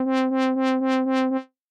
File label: LFO filter; 我们将这个LFO分配到滤波器的截止频率旋钮上，创造一个周期性的颤动效果。